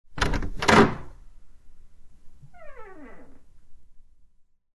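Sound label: door sounds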